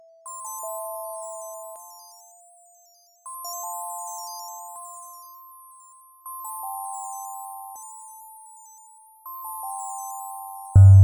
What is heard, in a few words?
FM example